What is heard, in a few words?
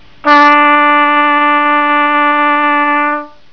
trumpet correct